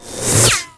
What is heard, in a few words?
Magic M REFLECT